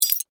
Crash, Glass, Indicator, Lamp, Crack, Under, Pressure; Shatter; BlastWave